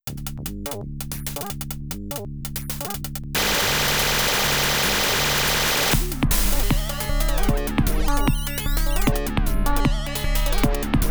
FM Acid; Groovemaster303; FM Acid v2; BMB Sega Genesis Bootleg Music Collection

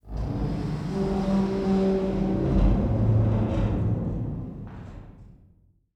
Tunnel Metal Open; Tunnel Nuendo Metal Open; inside

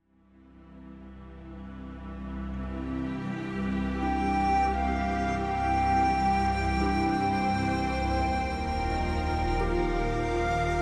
Background Music; Platoon

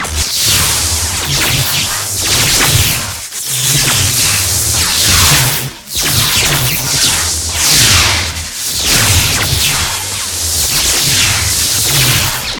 DEMON ELECTRIC SHOCK LOOP; BOSS DEMON LORD; Dungeon Defenders Eternity

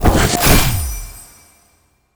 SFX spell harness magic

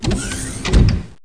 door ship Short

